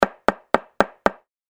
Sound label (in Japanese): ドアノック